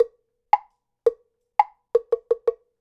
Temple Block, Latin Percussion; Sonic Foundry (Sony Creative Software); Temple Blocks

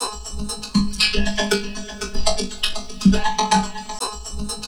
VOCOD, Drum Loops Crazy Processed

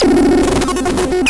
Cwejman S1; Blurrer; DevSnd Cwejman S1 - RD

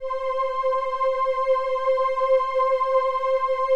LAMBDA, String Machines

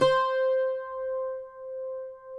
CUATRO C 5, Guitars; Spectrasonics; Hans Zimmer Guitars VOL-2

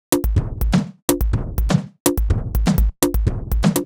Top 124bpm, House Drumloops; 90 s Sample CDs; Zero-G House Fabrik Drumloops; ZG Drumloops

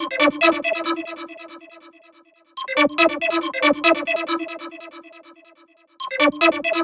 NRG Arp, trance

